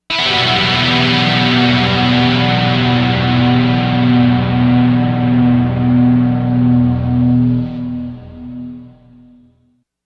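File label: dirty guitar e1005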